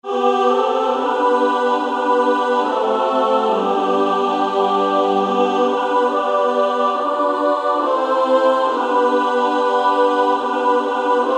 Female Choir

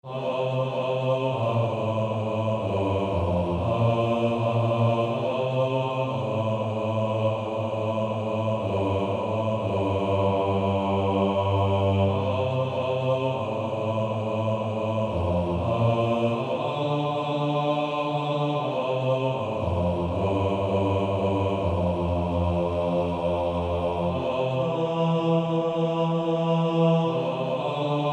Male Choir; He Hideth My Soul; Bass

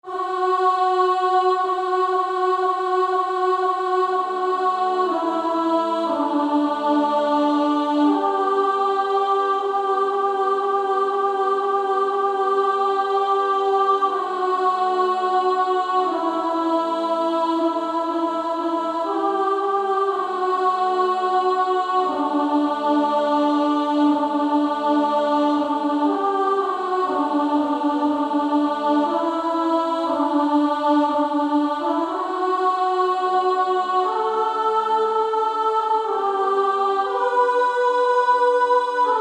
Female Choir

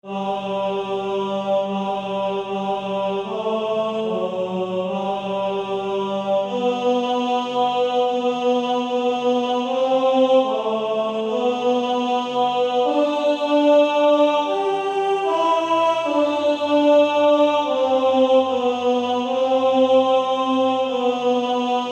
Male Choir, Practice Music; TBB

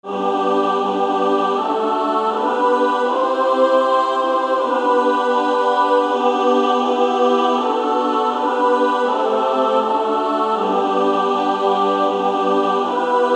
Female Choir

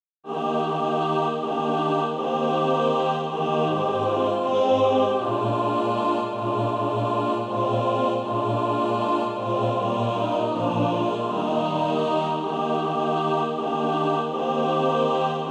mixed

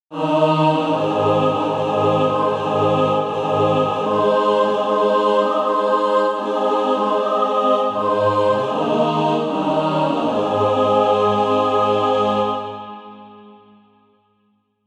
Bugle Call mixed

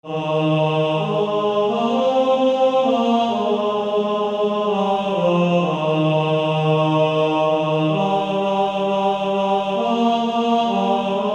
Male Choir; Follow On

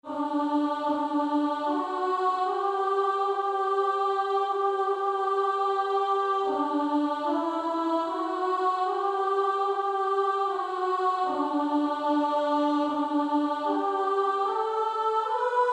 Female Choir; Gracious Spirit Dwell With Me

